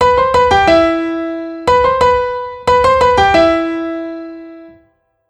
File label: Melo, keys